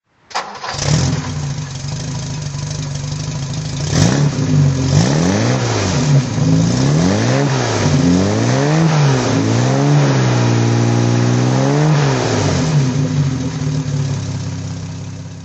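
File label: jaguar xk150 fhc throttle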